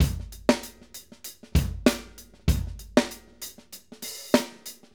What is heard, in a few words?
Acoustic Live Drum